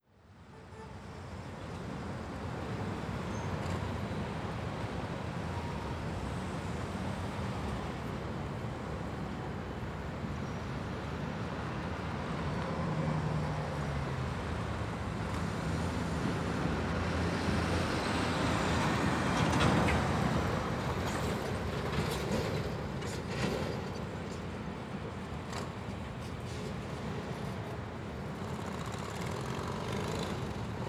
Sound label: City Traffic Close; Ambience